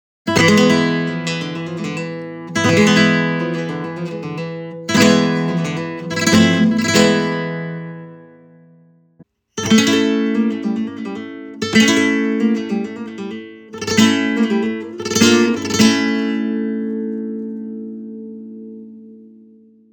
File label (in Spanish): Solea, flamenca; la cejilla flamenca